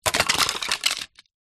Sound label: foley wood small thin pieces drop ground many; zapsplat